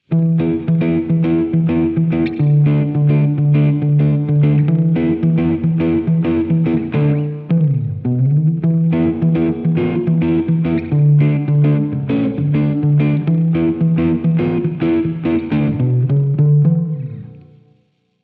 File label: X-SPACE EARLY REF; xgear